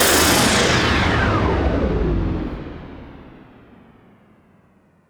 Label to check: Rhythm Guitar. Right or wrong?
Wrong